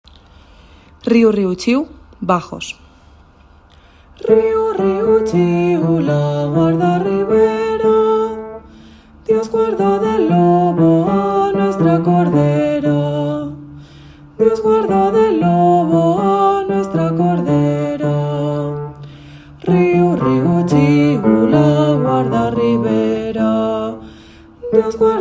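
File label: riu riu chiu bass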